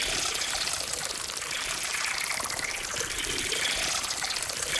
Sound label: Spider Loop; Game rip; MiSide